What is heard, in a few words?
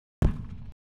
fight